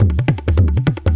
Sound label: Tabla